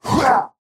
effort melee small; Slork

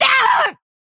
pain laser; akira

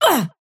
pain small

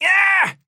pain small; Trapper

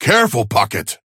ping careful synth

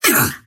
pain big

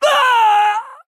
pain death; Trapper

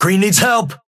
Synth ping green help

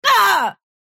pain big

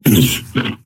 efforts

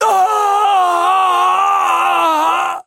pain laser

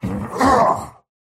effort melee small; Slork

